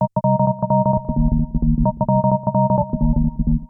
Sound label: Kaossilator gated (130BPM); Korg Kaossilator; creative gate; musicradar